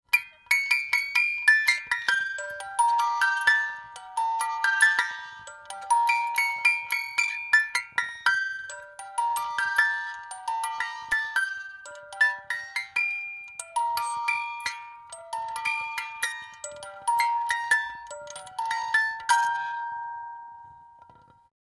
fur elise musicbox; recording; everyday objects

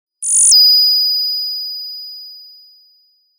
long tail ringing sound; standard ringtones

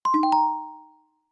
hide and seek, message tones

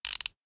wind up, message tones

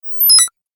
sorted, message tones

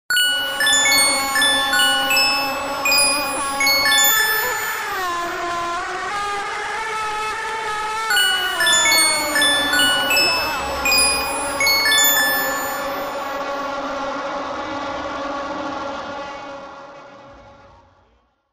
singing vuvuzela; mini ringtone songs

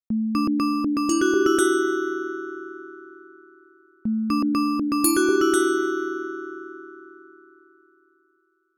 two turtle doves, christmas ringtones

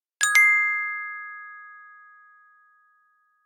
pristine, message tones

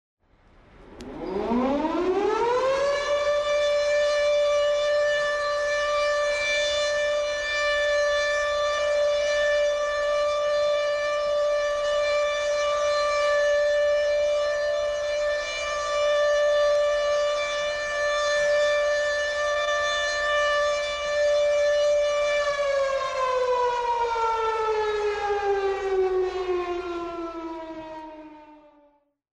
siren air raid; wake-up tones

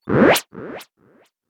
shovel

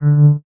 quiet twitch; message tones

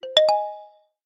elegant, notification sound

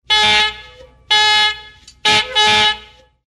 old taxi horn; wake-up tones

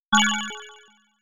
successful, message tones

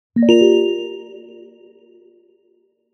emergence ringtone

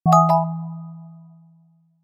confident notification

